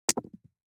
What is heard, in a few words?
DICE on Felt, Throw and Roll, Standard, 4 Four Dice; Samples; ArticulatedSounds; DICE Sfx Party (Extended)